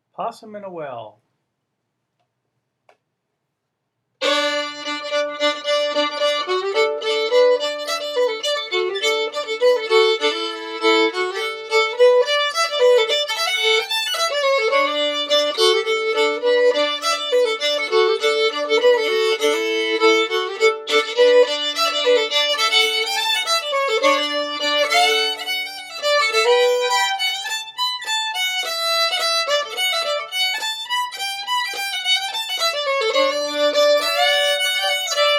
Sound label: fiddle; festivaltunes